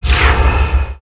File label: jet engine